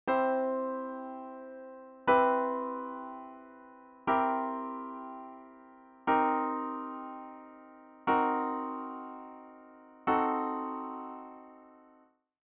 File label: Tetrachord Progression